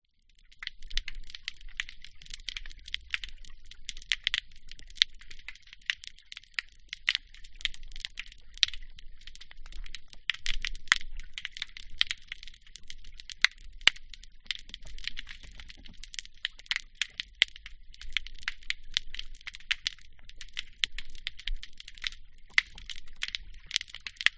nature water underwater recording stones sand movement against jetty current; crackle pop; zapsplat